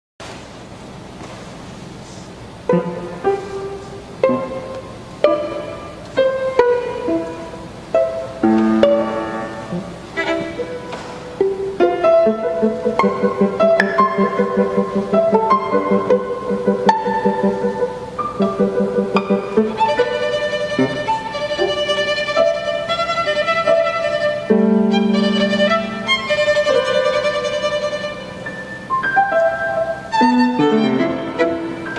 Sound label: Violin and Piano; Condensation; Shigeru Kan-no